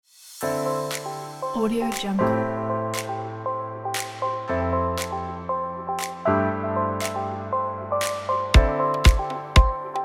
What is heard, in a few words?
inspiring corporate